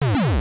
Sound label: Cannon Shot